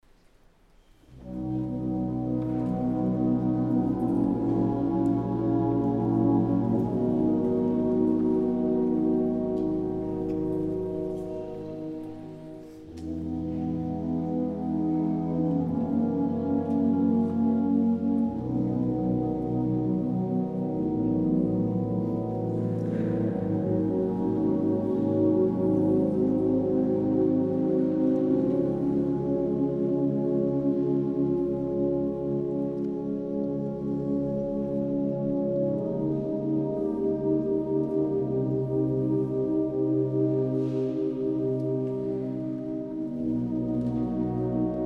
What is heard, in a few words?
Wind Band; Misa Consagracion